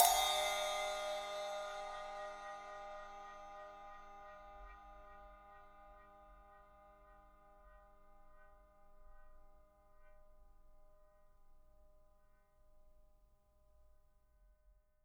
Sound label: 10 EFX CYM, FX CYMBALS, Living Drums; Sampleheads; Peter Erskine Living Drums